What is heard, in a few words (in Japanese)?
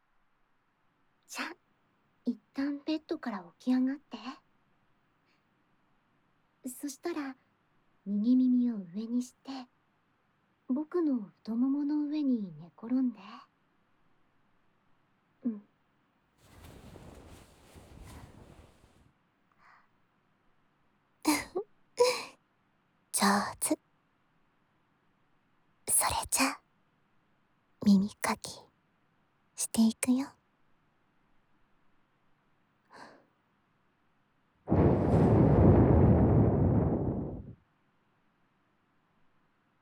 柿渋真竹の耳かきとと馬毛ブラシでの右耳耳かき